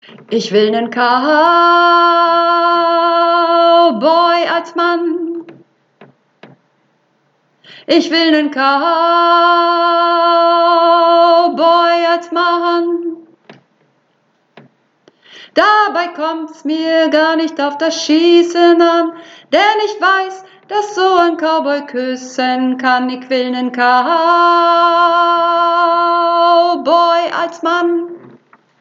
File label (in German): Alt, Uebungsaufnahme